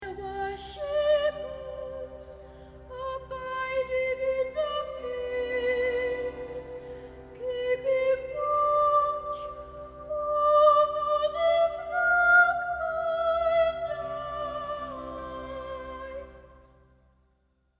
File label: basso continuo